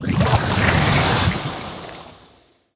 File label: Lava Spout